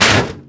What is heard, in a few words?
metal table hit; bouncyobjects; Tony Hawk's Project 8; XBOX